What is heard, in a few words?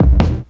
Kick; Esoterik Beatz; PH7 Kit